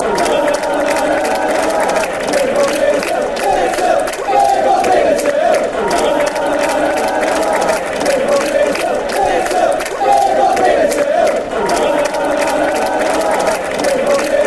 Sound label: chant, fanchants; peter till